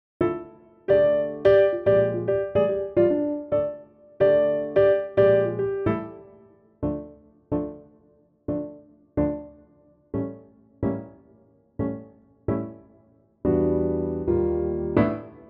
piano

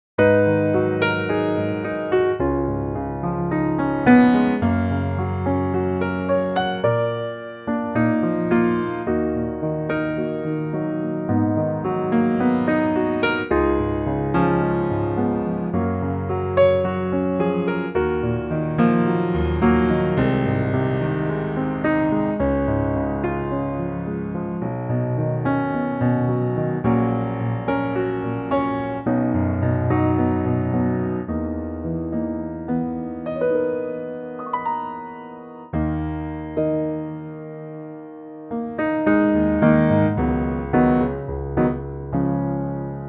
Ebm piano

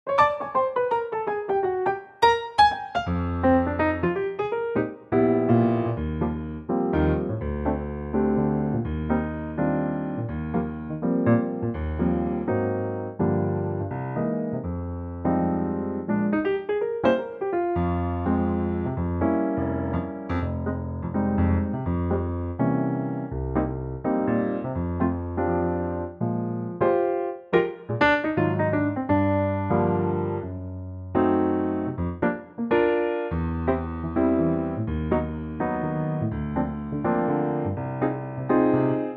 Eb piano

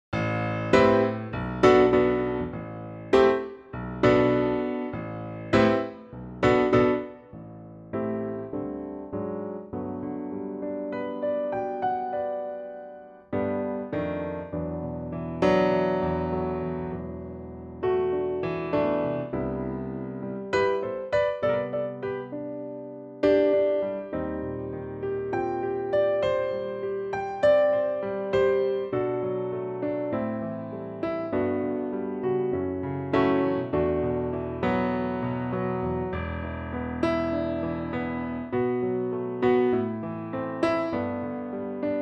G Piano

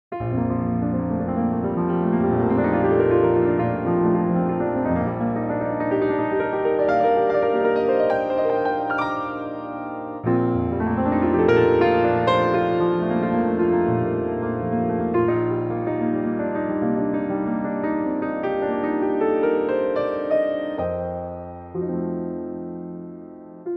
Bb piano

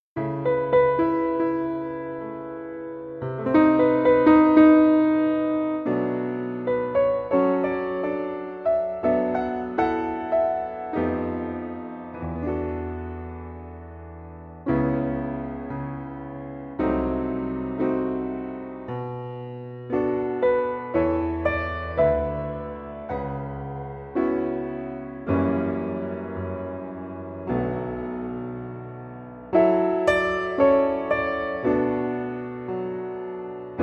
A piano

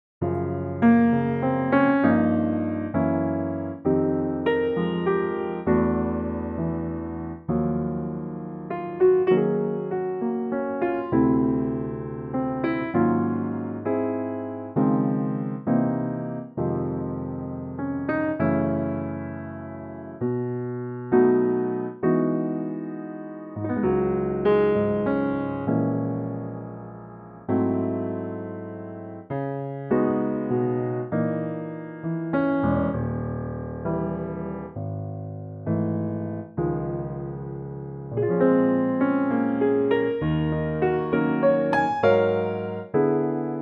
Db Piano trak

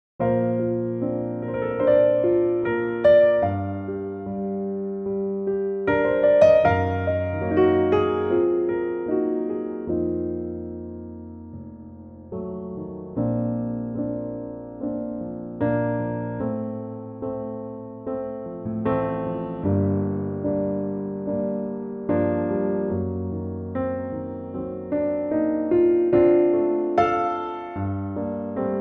Bb piano

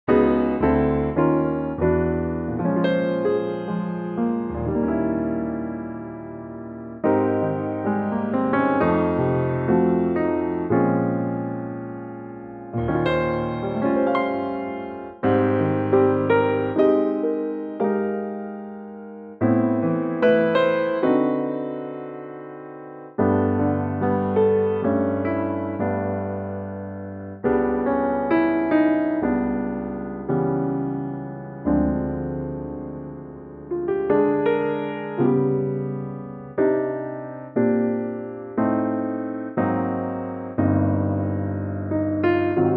Cm PIANO

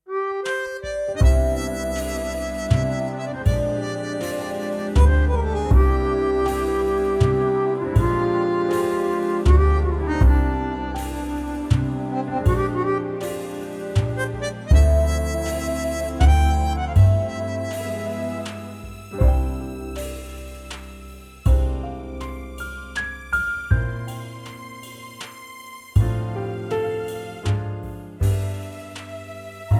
C

